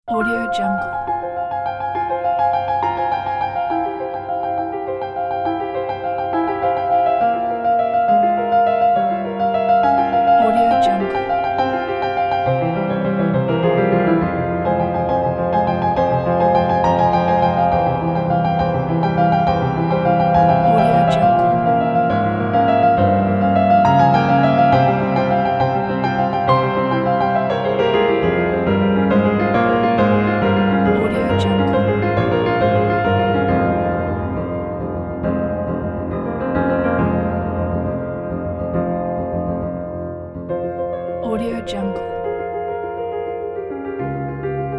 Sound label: emotional piano; soundgrenade